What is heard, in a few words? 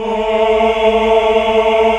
HAL AAH, GREG. AAAH Uni; Sound & Vision - Gigapack II CD 1 (Roland)